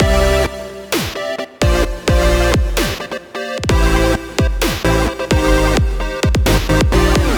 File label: chords